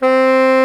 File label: TENOR SAX C4; Greytsounds VOL-1